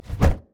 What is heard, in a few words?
dragon wing, monsters; LoL